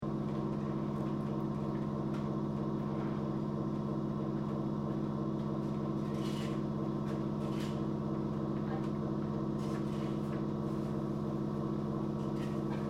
Train JR Regional Aomori Local Interior Idle Loop, Japanese Trains